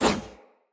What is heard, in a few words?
Player Short Sword Whoosh; Weapons; Swords